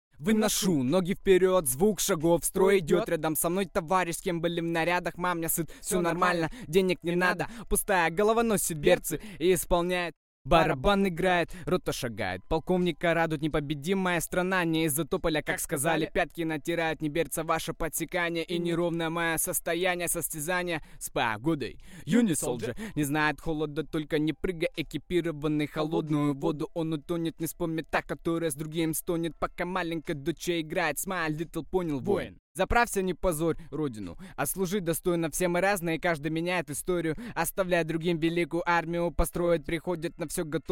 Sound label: RUSSIAN VOCAL rap 90 Bbm, Acapellas